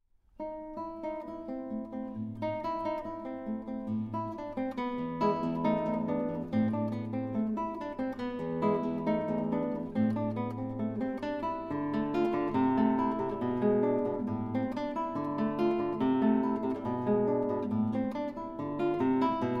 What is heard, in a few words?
divertimento, guitar